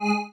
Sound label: marimba spacesuit; ゆめ2っき